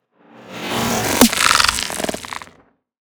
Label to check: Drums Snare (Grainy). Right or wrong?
Right